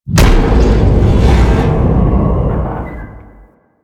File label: glass sphere void shake; ambience; Warframe; Entrati